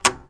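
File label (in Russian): кнопка клик одиночный короткий резкий близкий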